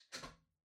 Wall Outlet Far Dry Plug In

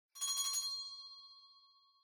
Winning Bell Far Strong

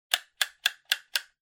Cutter Click

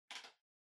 Calculator (Far Switch)

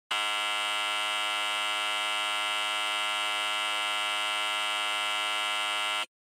Electromagnetic Buzzer Dry Long

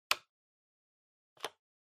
PC Keyboard Shift

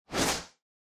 Motion Agility

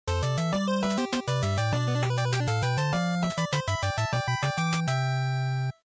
NES Shooter Clear2

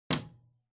Light Switch Rocker, Wall Hard

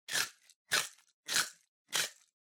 Spice Mill Pepper

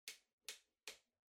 Electric Fan Far Angle

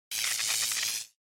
Electric Shock Short

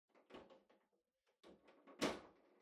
Air Conditioner Far Panel Open

